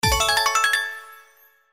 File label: Symbol Scatter Win; Genies Wild